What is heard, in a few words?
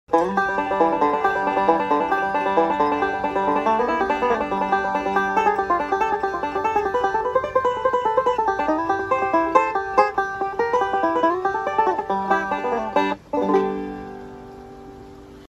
banjo